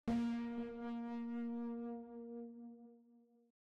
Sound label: Mushrooms Bloom